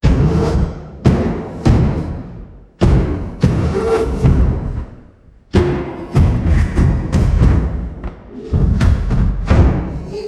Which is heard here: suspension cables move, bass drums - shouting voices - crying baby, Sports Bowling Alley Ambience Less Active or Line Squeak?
bass drums - shouting voices - crying baby